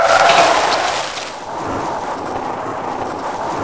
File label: grass skid, vehicles